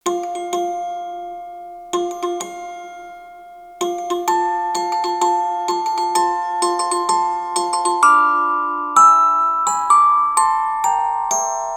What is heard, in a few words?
Orgel Wedding March; tree of savior